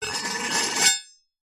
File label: household kitchen knife large blade loose scrape on solid surface; zapsplat